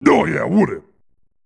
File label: pain; mr-satan